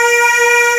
AAH C4, VO; AKAI S6000 CD-ROM - Volume 1; SAMPLE WAVE MIXING 1

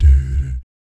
BS D-D, Vocal Jazz FX; Spectrasonics; Vocal Planet; JAZZCOMBO

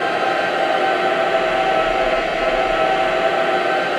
AVANTE G, Voices

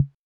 Bongo, Acetone Rhythm-King

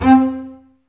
STR, Cb Bowed, Cb Marcato; Roland L-CD702

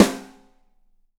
25 4X15 R, Drums, TUCSON KIT; Big Fish Audio; Ross Garfield Drums VOL-2

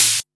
Open HH, Cymbals

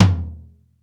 BEAT KITS, T; Crypton - DrumBase5000

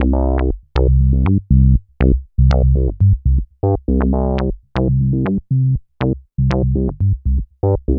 Bassline, Prototechno

